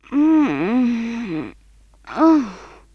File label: eat cake female